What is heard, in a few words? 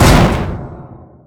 gunnery cannon shell; Weapons; EK modern; Barotrauma